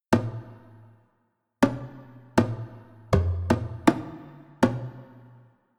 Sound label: Elegy of Emptiness (Drums)